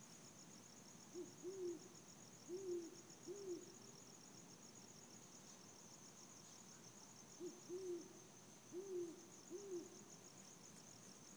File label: hooting owl